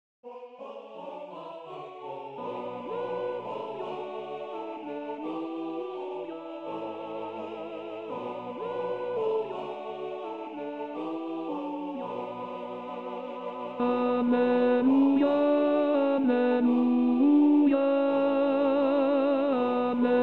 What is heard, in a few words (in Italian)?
Alleluia Uomini; anno liturgico